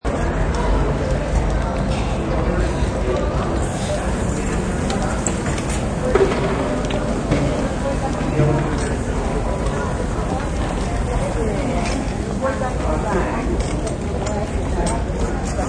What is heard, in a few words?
Hospital Ambience, Backround sounds of a hospital including nurse chatter and equipment noises; Part of Hospitals